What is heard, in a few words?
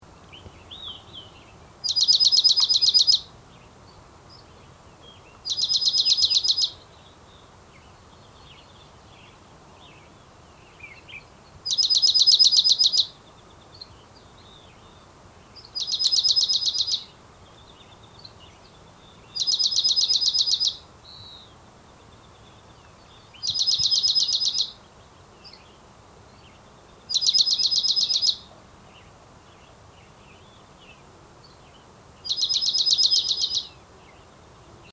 Dark eyed Junco Junco hyemalis oreganus